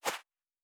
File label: Footstep Sand Walking